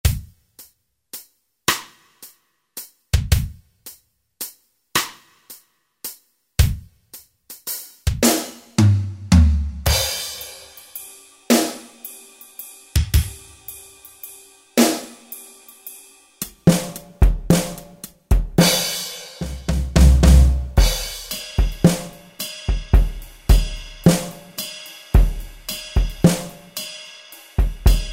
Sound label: Ballad, Rockabilly, Bluegrass, Country patterns, Boss DR-770; Preset Patterns